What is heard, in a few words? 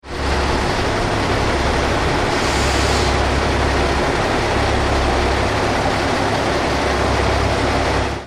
kiha, rail